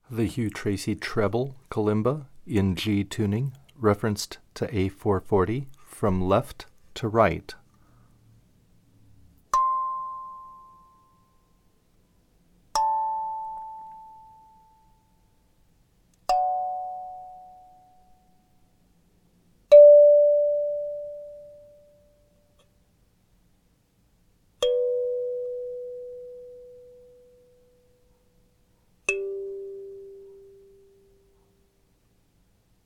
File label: treble G, kalimba